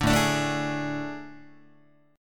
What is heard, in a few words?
A Major 7th Suspended 4th, Guitar; AM7sus4; strummed; JGuitar